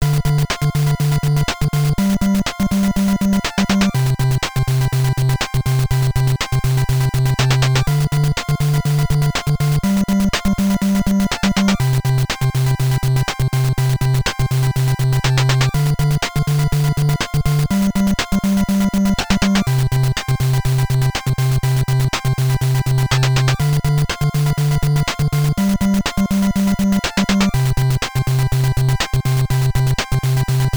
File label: Good Powerup; kid klown in night mayor world nes